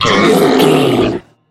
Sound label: troll monster death